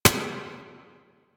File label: Lasrian APC Turret Low Clip, Weapons; Warframe